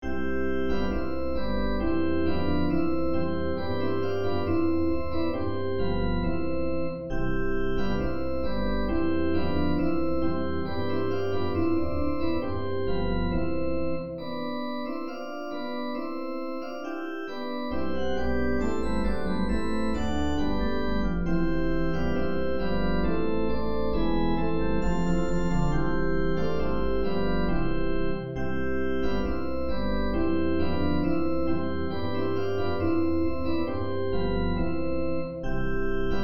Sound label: deck the hall